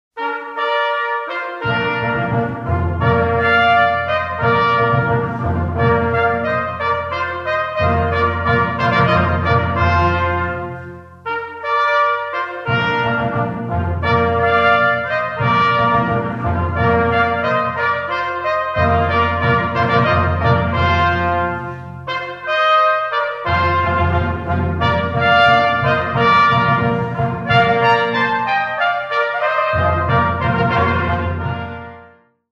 Aufzug

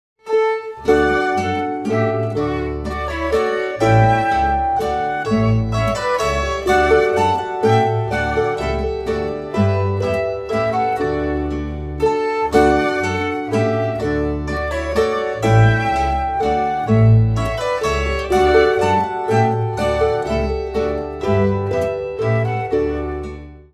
Spielmusik Deutsche Taenze

